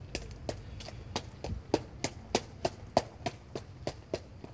Pasos

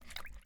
Gota

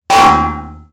Golpe De Olla

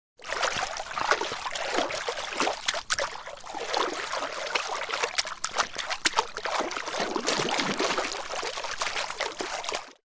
Piscina Agua

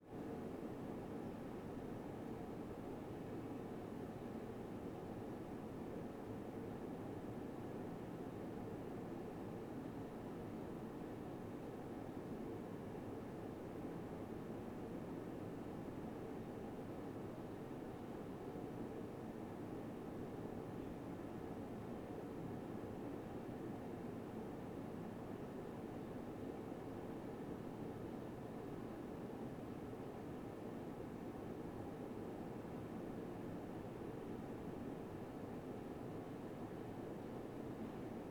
Oficina Aire Acondicionado

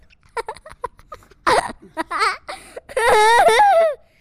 Risa

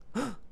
Asombro

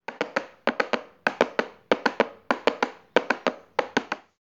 Caballo

